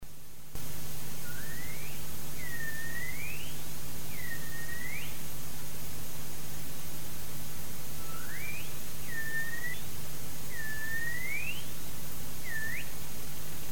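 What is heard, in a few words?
white-beaked dolphin whistles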